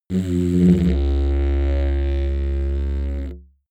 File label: didgeridoo short tone